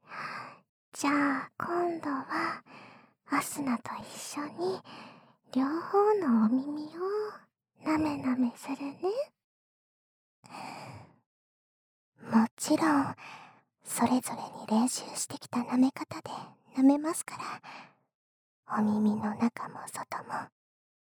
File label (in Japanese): お耳の中と外を同時に舐め舐め